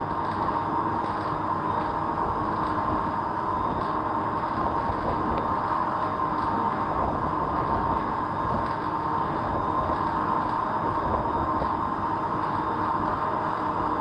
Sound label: wind turbine body turbine contact mic; fvtt sonniss gdc audio